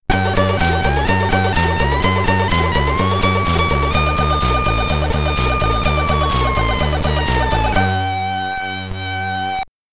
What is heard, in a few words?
Ending, SID Stereo; Radax; Commodore 64